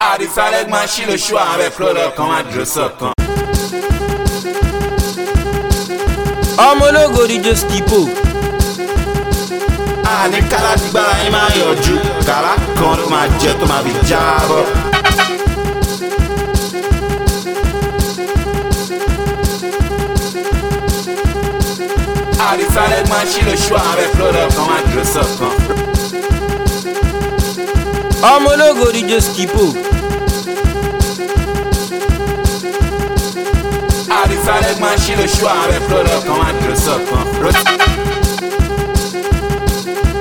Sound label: OGBA DANCE MARA BEAT